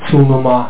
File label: PAD; Space Taxi; speech german